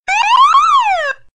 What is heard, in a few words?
Sirene